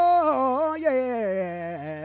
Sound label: VOCAL; NAMM 2018; MyMix Recordings NAMM 2018